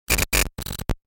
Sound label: Error glitch